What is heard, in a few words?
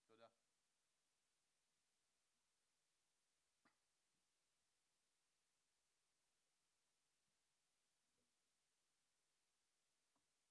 HiHat, 94bpm